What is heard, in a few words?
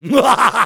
laugh evil, fr; Soldier